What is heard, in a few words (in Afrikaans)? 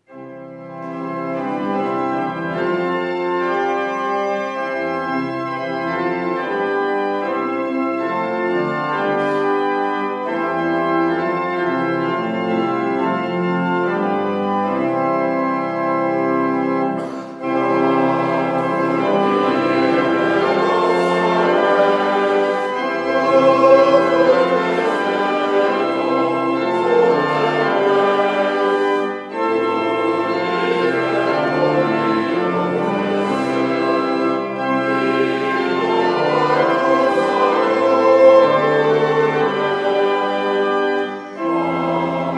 Gemeentesang